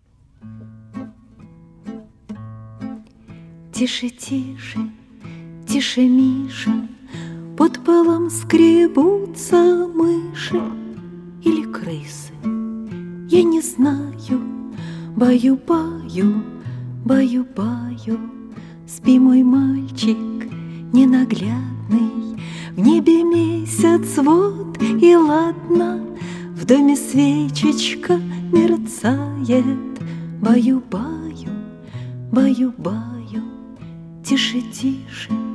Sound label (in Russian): Колыбельная